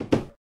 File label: Door Close; Robot 64